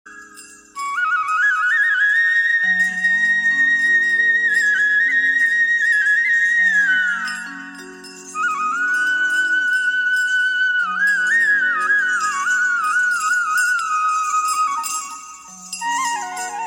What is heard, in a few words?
krishna flute